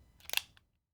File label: Revolver Hammer Back; SoundsCrate